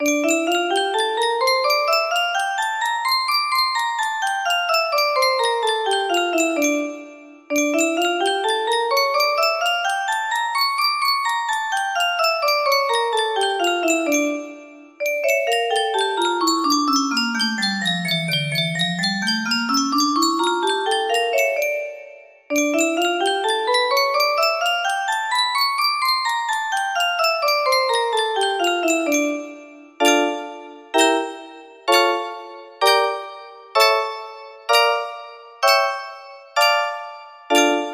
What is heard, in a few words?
d minor, music box